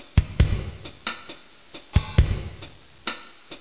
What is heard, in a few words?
load; Bible Fun Pack; BIBLELND